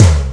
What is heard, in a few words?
Tom Room